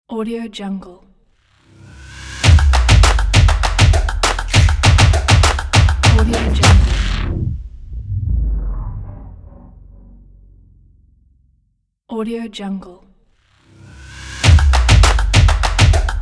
the drum logo

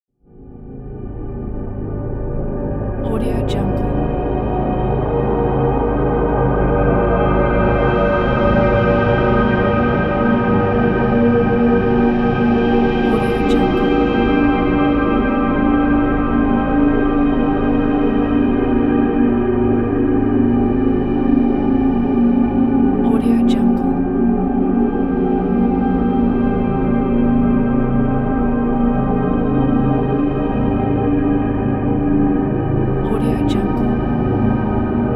atmo drone armenian sun low; smartsoundfx

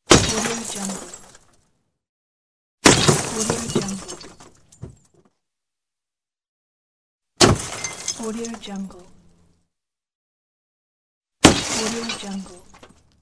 crash

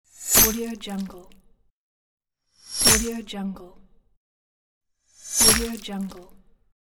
scaffold

